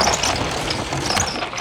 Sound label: engine move; weapons; dota; creep